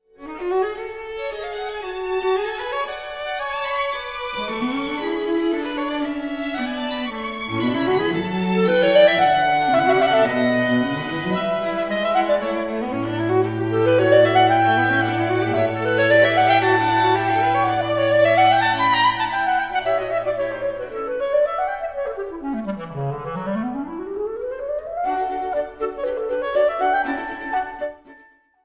quartet no 3 in d major op 7 for clarinet and strings 4th mvt finale; bernhard crusell; allegro